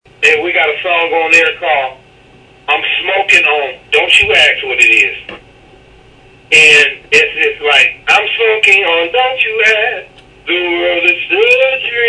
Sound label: singing